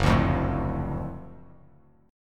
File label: Gb Minor Major 7th Sharp 5th, chord; strummed; JGuitar